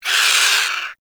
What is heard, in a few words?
Avichaea Vocal Death; Hunting Critters